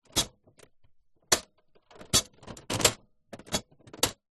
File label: electronic typewriter plastic paper access flap movement; office; brother ax 450